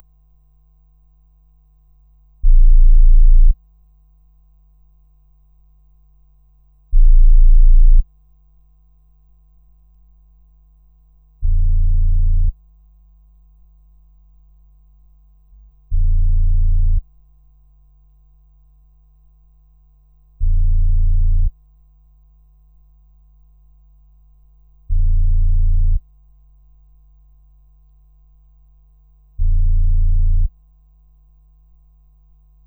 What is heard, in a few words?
Noise Low